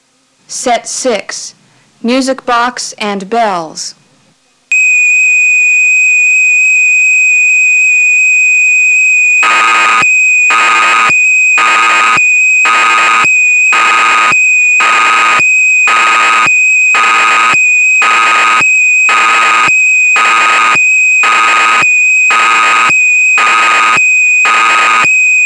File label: Musicbox And Bells; dx9